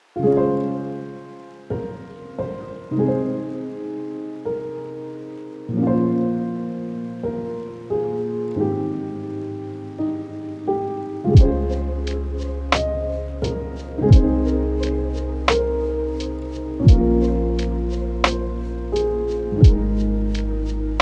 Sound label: lofi hip hop